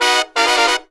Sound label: Phantom Horns, 132BPM; Zero-G